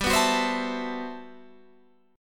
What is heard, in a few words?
G Major 13th; Listen to GM13 strummed; GM13 Chord